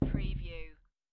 Dice Throw One Die On Felt

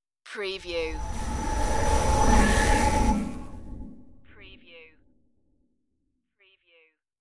dimension power glitch

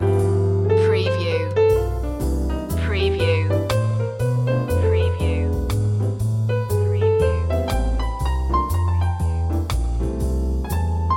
coctail groove

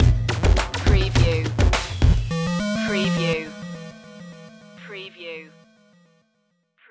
retro video game snake invasion stinger

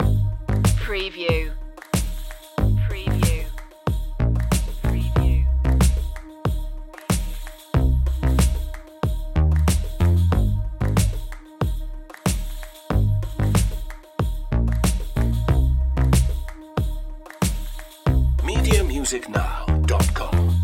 bluestone streets loop

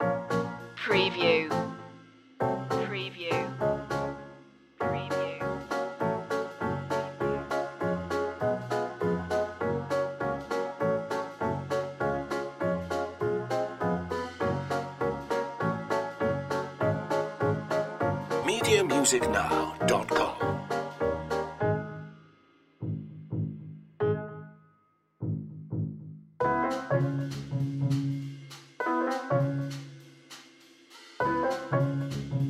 bugsy bop loop

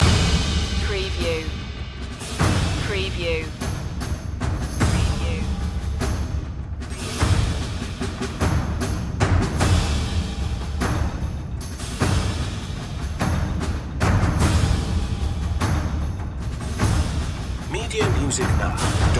percussion loop

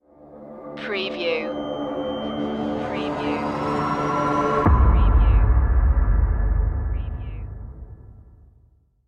creepy sting

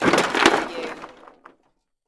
Impact Wood Metal Cement

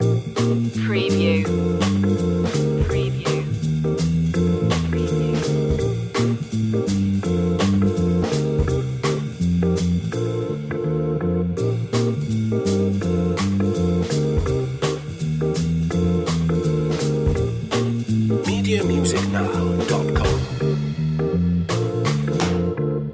scooter ride loop

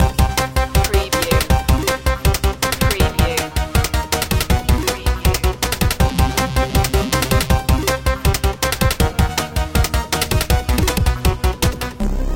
retro video game countdown to zero

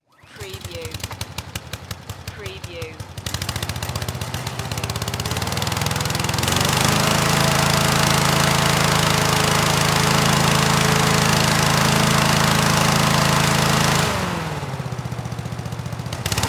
Fire Truck Fan Pull

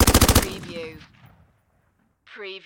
Machine Gun Steyr Aug

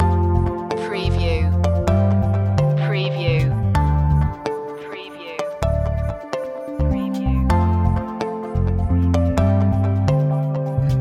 broken beats loop, chill

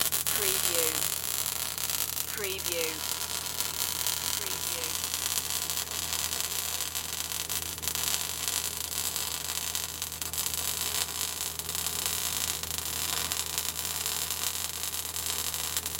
Spot Welder Operate

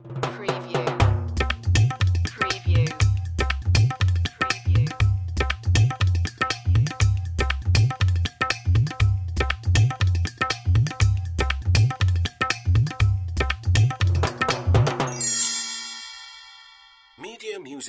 Musical; Production Element; Sound Effects Bible

